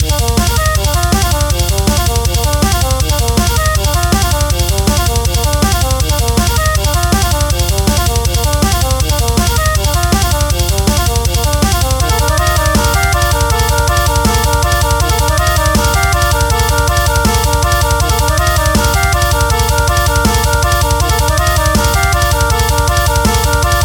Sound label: Flute Room; Yume Nikki